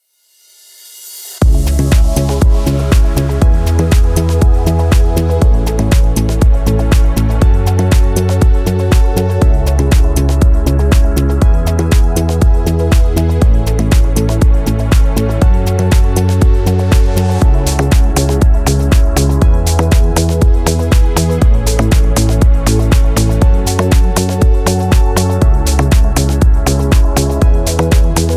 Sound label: instrumental; radio